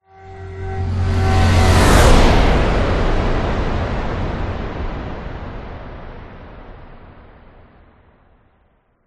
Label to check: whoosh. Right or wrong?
Right